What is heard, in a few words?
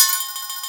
STARMETAL; Best Service Advanced Media Trax Modern Composer